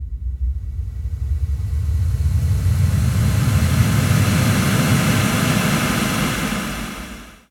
128BPM Kick FX; FX Fills; 4Bars; Vengeance Samples

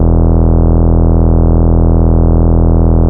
Root E, Bass; Vengeance Samples; Vengeance Ultimate Bass

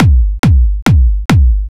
Kick 139BPM, Drums & Perc; G#minor

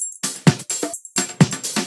Percussive Loops 128BPM; Vengeance Samples

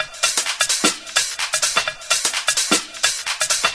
House Loop, 128 BPM; Vengeance Samples

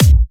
Bassdrum; Vengeance Samples

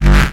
Offbeat Bass G; Vengeance Samples

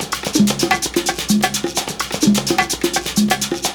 Latin House Loop 128 BPM; Vengeance Samples

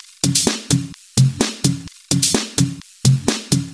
Minimal Loops

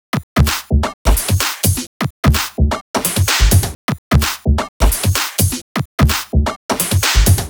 128BPM Heavy Duty Kit

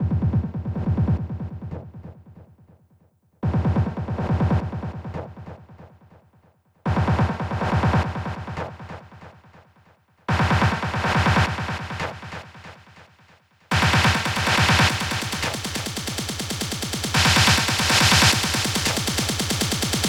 140BPM SNARE 16 Bars; Ambitions; Vengeance Samples; Snares&FX Pairs

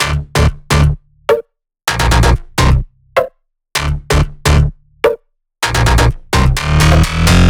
128BPM Latin Melody

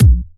Bassdrums

